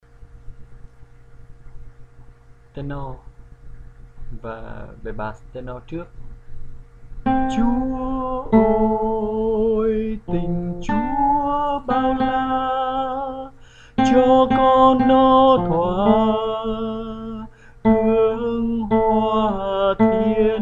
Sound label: Tenor Bass